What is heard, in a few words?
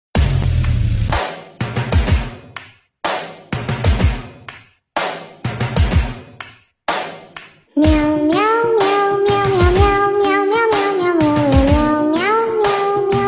Bongo Cat Music